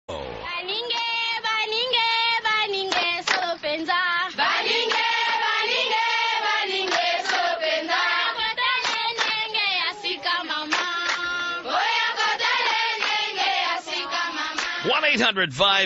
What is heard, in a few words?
African Tribal Style